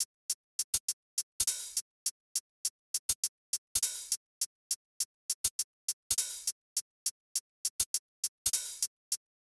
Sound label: Hat, Drum Tracks; Hip Hop; 102 Fmin; big fish audio; Hip Hop Exotica; Apple Loops